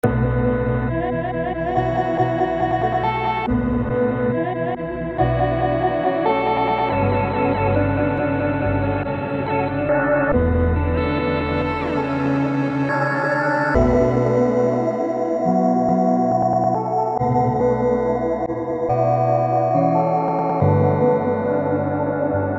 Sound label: loop chroma glitch